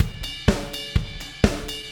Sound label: 125 Disco Drums, Fast Tempo; Pop Rock; Digidesign; Pro Tools; Pro Tools Essential Loops